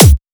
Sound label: Kick C